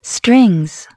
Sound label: strings